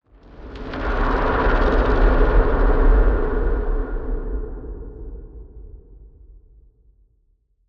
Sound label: Cinematic Transition; soundbits